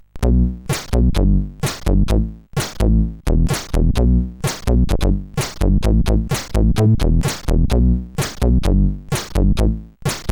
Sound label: Funky Fun, sid; Ash 9